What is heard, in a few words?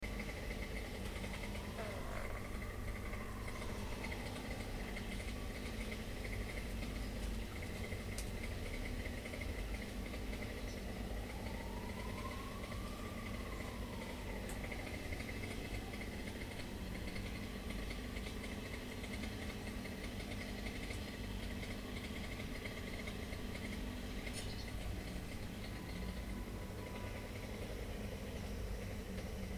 chainsaw